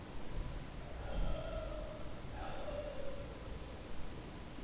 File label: EVP crying